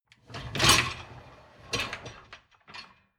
dishwasher lower tray draw out; maschinen; spuelmaschine

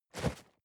cardboard box medium shake single; objekte

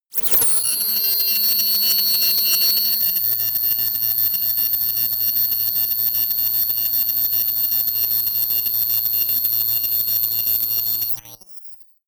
sci fi swell evolve; atmosphaeren umwelt

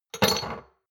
shot glasses place multiple medium; berufe; gastronomie; soundpool

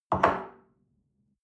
espresso place on table soft, restaurant cafe

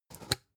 spice jar big scratch open; industrie wirtschaft; gastronomie

